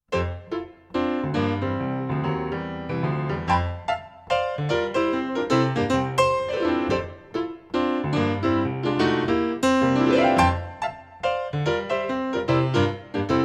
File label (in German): Psalm F-Dur